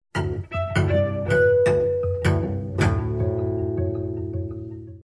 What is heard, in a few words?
Cello Suite 2 Variation 3